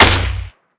Fire

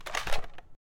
Equip Stock; FSP9

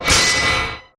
Shield Break; SCP-049; 173 Hume Shield

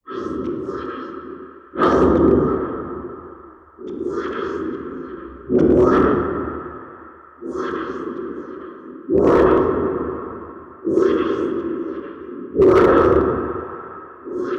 Idle Breathing

